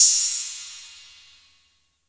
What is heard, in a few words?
Ride; Drums; Ableton Live 12 Lite Core Library